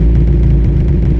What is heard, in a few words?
Toyota Supra-A80 Idle